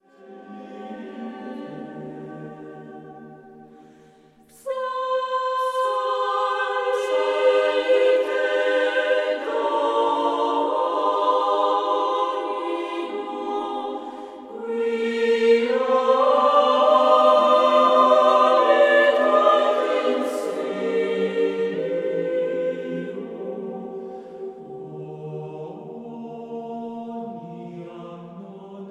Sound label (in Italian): voci